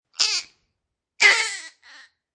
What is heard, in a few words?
Baby Whine, Newborn baby sound; Babies; Voice